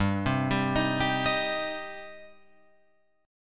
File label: G Suspended 4th Sharp 5th, Guitar Open F; arpeggiated; 7,7,7,10,10,10; JGuitar